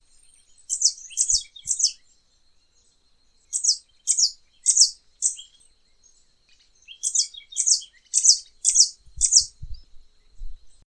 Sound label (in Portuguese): Canto do Sanhaco Papa Laranja